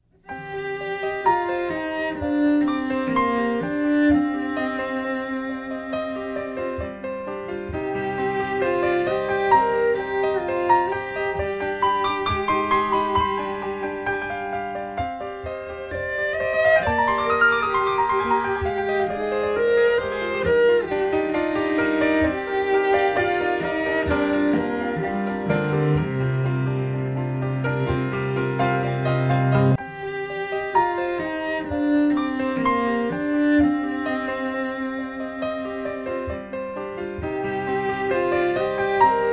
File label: instrumental